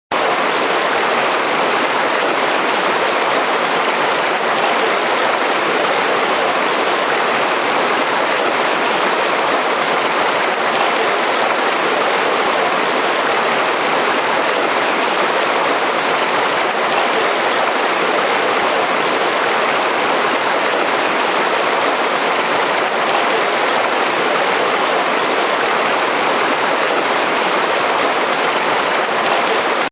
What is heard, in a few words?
Mountain River Medium, Constant rushing sound of a mountain river; Mountain River Medium Pitch; River Sound Effects; Sound Effects:Nature